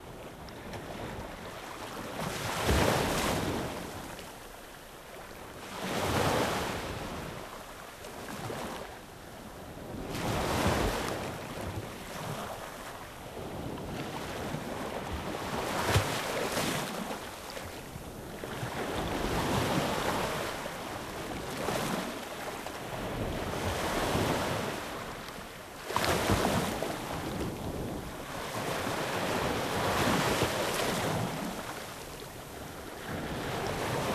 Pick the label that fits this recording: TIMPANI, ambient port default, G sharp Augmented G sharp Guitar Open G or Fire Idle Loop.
ambient port default